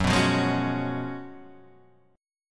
F sharp Major 7th Suspended 2nd Suspended 4th F sharp; strummed; x,x,4,4,5,3,3,3